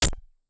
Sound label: bear trap; dos lem